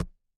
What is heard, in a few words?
impact bounce